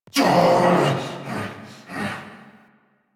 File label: Pain Grunt; Nightwave; Season Three Glassmaker; Nihil Fight